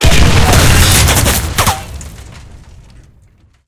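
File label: tank hit high-explosive big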